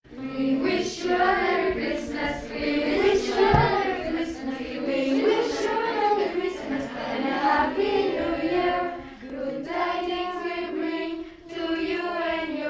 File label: We wish you a merry christmas chorale; Charles Péguy